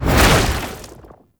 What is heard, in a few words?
Wall Climb Deactivate; Warframe; Oraxia